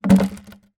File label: leisure draughts checkers outdoors large plastic piece down drop